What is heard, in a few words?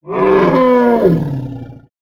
Pets Elephant Beg; creatures; Lego